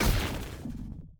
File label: shoot Energy Field; Mindustry